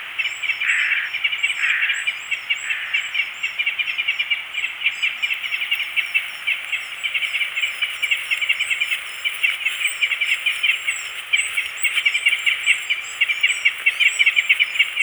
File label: Crossbills; Tatton